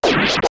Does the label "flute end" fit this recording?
No